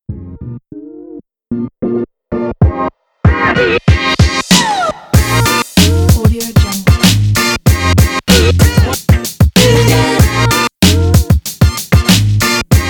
upbeat hiphop background

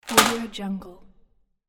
box of matches falling

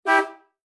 vintage car horn short blast

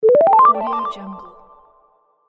smooth glider achievement; monochromeeffect

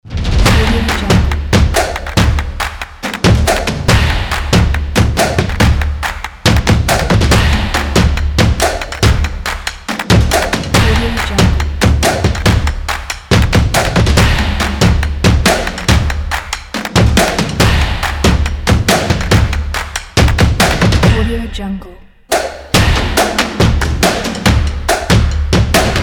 the percussion

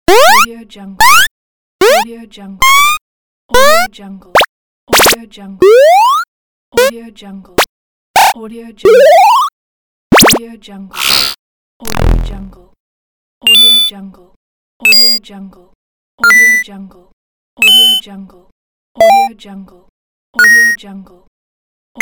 game fx sounds

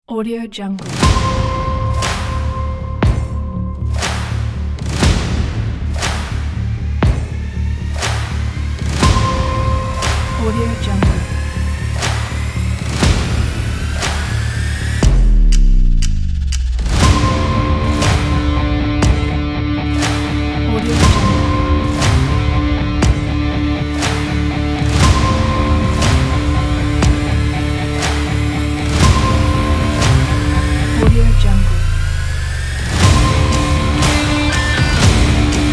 dark cinematic rock trailer kit

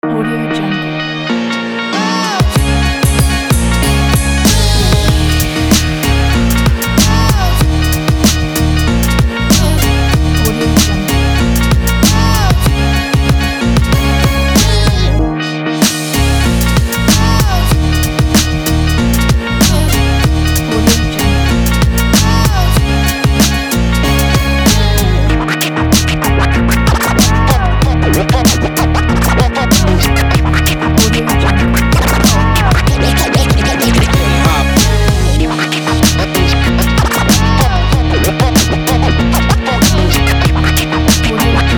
lifestyle hip hop

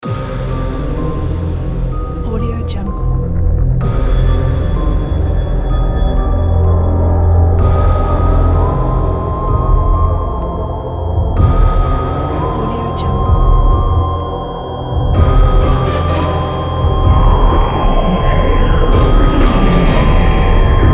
creepy halloween cinematic trailer

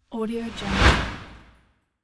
air hit transition; music-fish

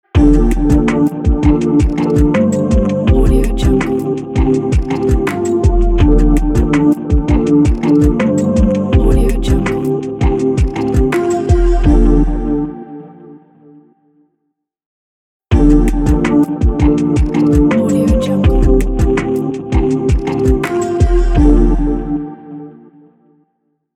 intro podcast opener logo

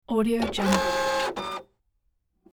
inkjet computer printer warms up

